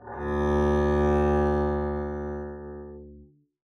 arco d2 mf up, double bass; dsmolken

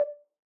Ocarina Sus D4 rel, Ocarina, Typical, Releases; Aerophones; Edge-blown Aerophones

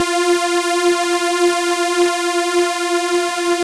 Super Saw F4

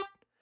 Hohner Super64 Vib rel G3, Harmonica; Aerophones; Releases; Free Aerophones

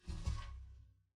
pizz noise set bass on floor, double bass; dsmolken

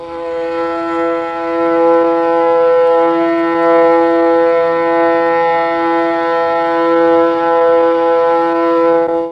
E3 300 VIOLA, mellotron; 300 STRINGS

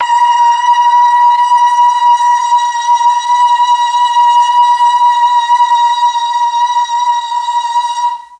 B3 BOYS CHOIR, mellotron

